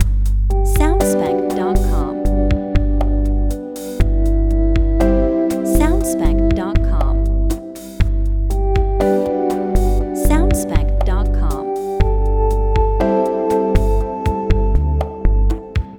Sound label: Afternoon Lounge Short Loop